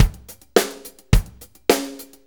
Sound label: 4-4 Loop, Drum Loops, Full Kit Loops; Sonic Foundry (Sony Creative Software); Loops & Samples Drum Tools